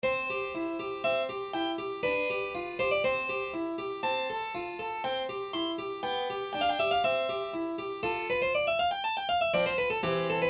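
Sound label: K545 pythagorean, tuning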